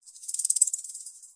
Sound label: rattle, ethnic and world percussion; Rattles